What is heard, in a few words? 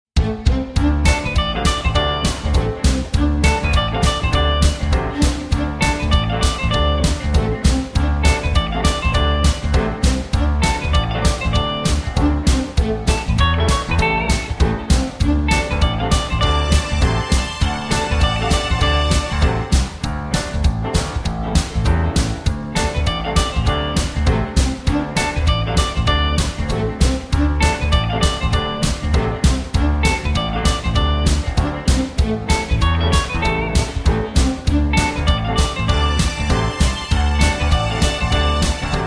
(D) No Backing Vocals